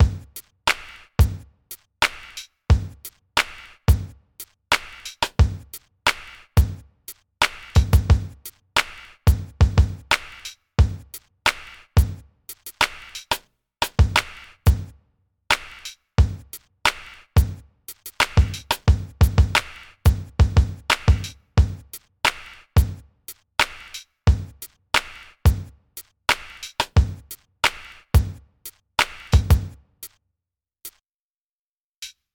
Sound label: Hip Hop Drum Loop